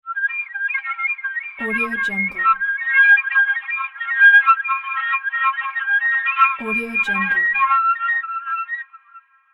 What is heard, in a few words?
harmonic ethnic world flute